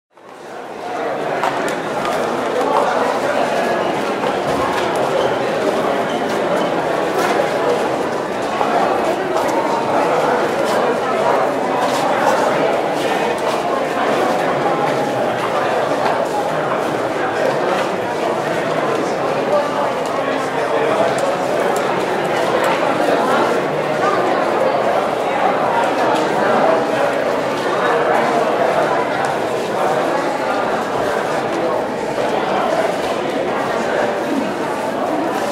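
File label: sound of crowd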